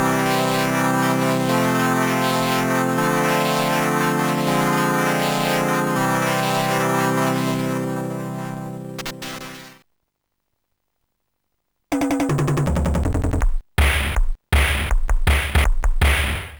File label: first melody; mike's first melody; Sonix Music Driver